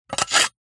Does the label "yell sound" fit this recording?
No